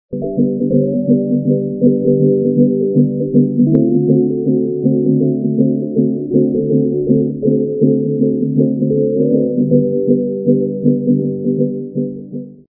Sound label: music low